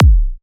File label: Kick; Feel